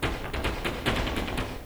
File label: perc riddim